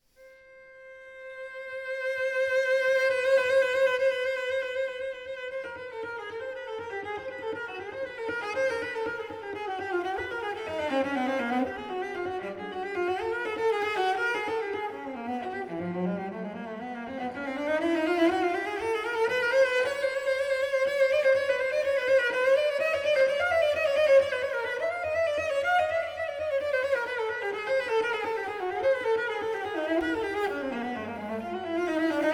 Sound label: arco pizzicato, quartet